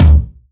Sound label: Tom; Ableton Live 12 Lite; Core Library; Drums